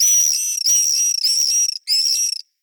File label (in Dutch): Duetroep uit nest, gierzwaluw; Xeno Canto